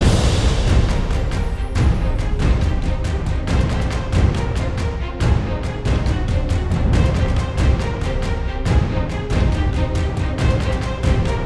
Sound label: Combat Perc